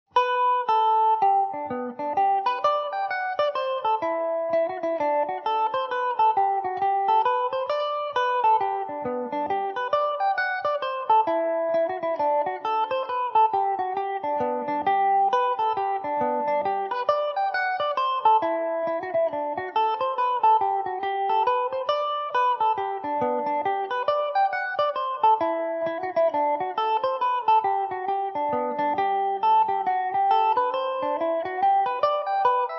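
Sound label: G major mandobird, irish mandolin; The Seven Derries; Epiphone Mandobird VIII electric mandolin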